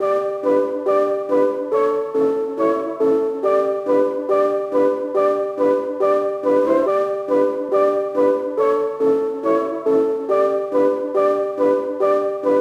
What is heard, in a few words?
Brassy, ringtones; Fairphone 6